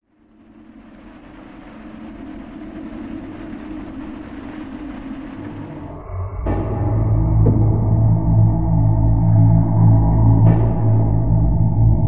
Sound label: Ambient (Alternative); Butcher